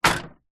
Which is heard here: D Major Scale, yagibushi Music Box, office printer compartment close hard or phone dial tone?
office printer compartment close hard